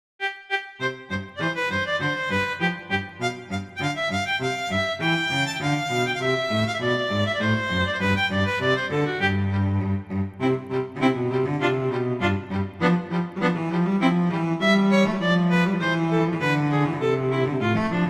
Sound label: Rondo, Allegretto; Mazas; Duets; Duet 11